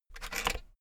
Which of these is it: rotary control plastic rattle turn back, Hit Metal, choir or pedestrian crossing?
rotary control plastic rattle turn back